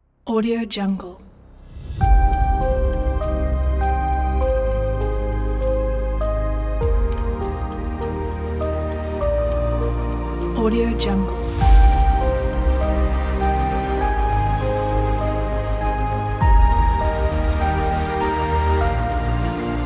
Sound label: epic trailer intro